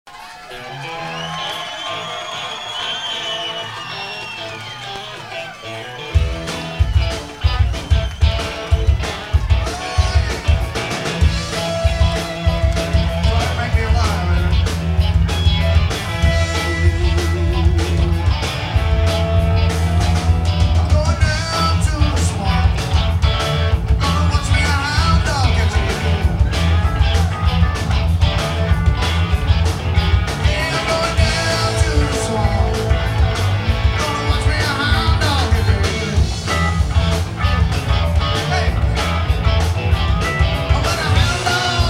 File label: Swamp Music